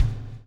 BLUE FB, BLUES; Spectrasonics - Backbeat

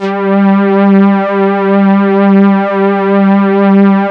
SYN BOW STR, ENSMBL; AKAI S-Series CD-ROM Sound Library VOL-8

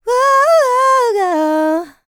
LICKS, Vocal Planet, R&B; ILIO; Dance; LT R+B

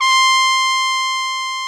TRUMPET C5; Greytsounds VOL-1

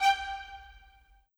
Violin; Sonic Foundry (Sony Creative Software); Orchestral VOL-2; Modern 09

